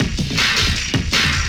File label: Loop, Drum & Bass, 160 BPM